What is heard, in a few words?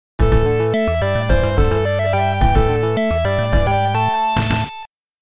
Unknow Jingle; king of the monsters 1 2 mega drive